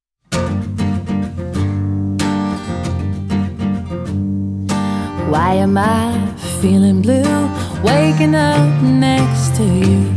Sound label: acoustic